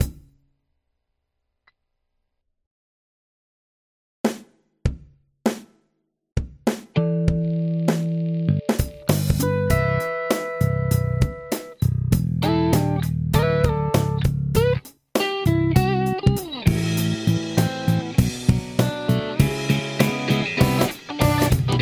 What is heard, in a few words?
guitar